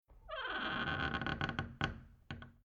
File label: Household Door Old Wooden Door Hinge Sound Squeak Creeking Eerie